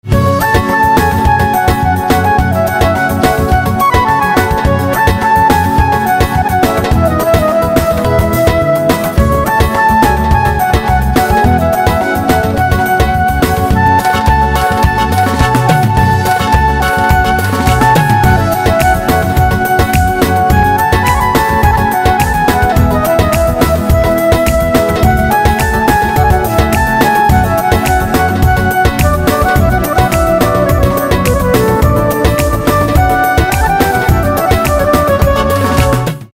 Organ

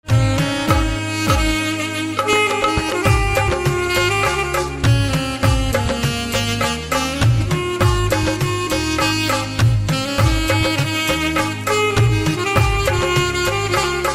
Saxophone

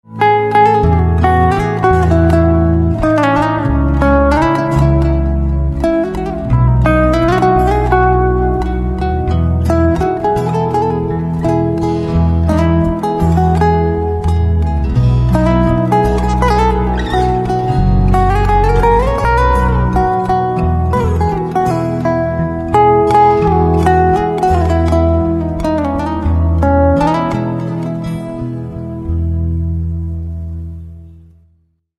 Guitar

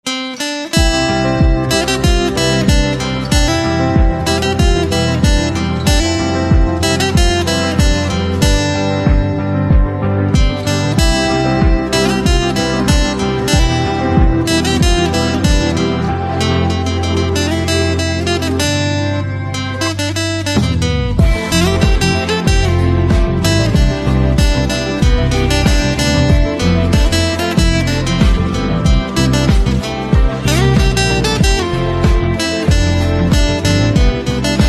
Guitar

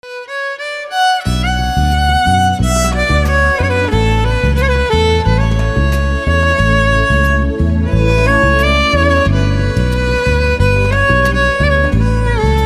Violin

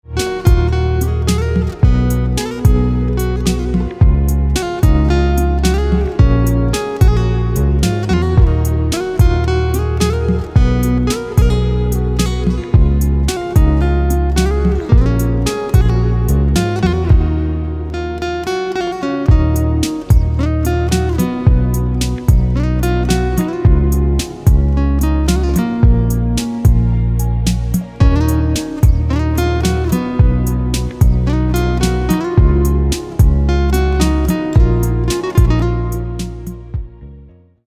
Guitar

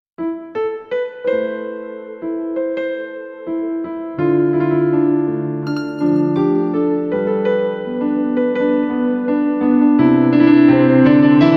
Piano

